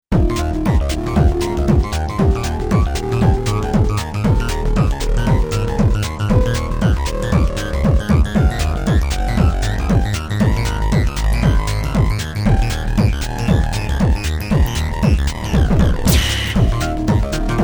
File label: Hard As Nails